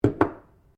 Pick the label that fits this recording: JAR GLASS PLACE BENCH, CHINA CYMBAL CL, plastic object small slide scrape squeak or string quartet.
JAR GLASS PLACE BENCH